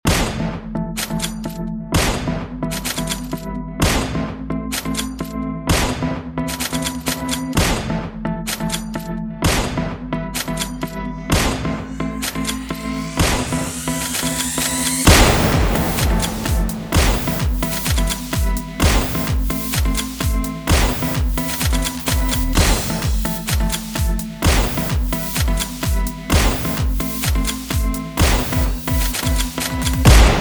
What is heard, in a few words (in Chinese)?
卡点快闪快手抖音枪声电子风格短配乐